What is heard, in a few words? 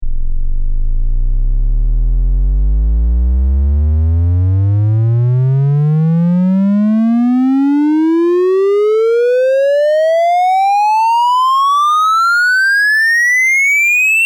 triangle 2048 lin 20-20k